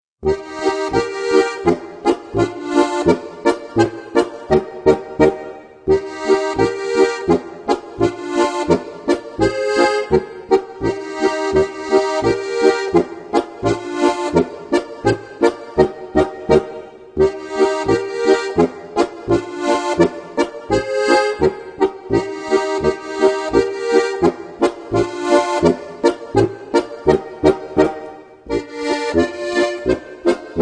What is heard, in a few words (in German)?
polka; 30 Volkstänze aus dem Alpenland